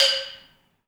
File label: BALAFON; BALAMBO; Heart of Africa